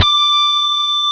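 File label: DIST GT3 D5, distorted guitar; DIST GUITAR3; AKAI S-Series CD-ROM Sound Library VOL-7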